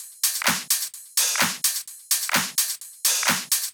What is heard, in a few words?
Loops 128BPM, Drumloops